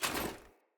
Foley Action Armour Run; Player; Deora Armour

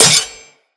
Dagger Metal Impact; Weapons; Dual Daggers; Hit Effects

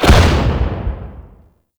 Grenade Explosion; Warframe; The New War; Kahl; Abilities

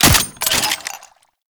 Alt Fire Mech; weapons; Scaldra AK

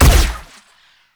Shot Fire; Warframe; Weapons; Acceltra Prime

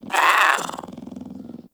Kitten Speak Playful; Warframe; Catbrow

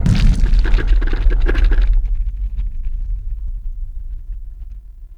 Large Cave Quake; Ambience; Warframe; Duviri; Murmur

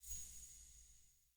UI Context Action; Eternium Garden

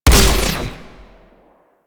Amp Chassis Fire, Amplifiers; Operators; Corp Amp Set One

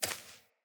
Sparrow Step Default, Footsteps; Animals

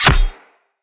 Long Sword Impact Flesh

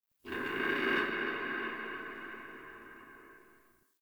Ext Creature Distant Low; Misc Outdoor

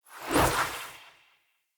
Staff Caster Cast Short; Weapons; Player; Staves; Starter

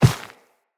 Walk Dirt, Footsteps; Operator

